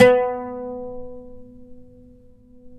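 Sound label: pluck OUD B; Roland - LA Composer vol. 3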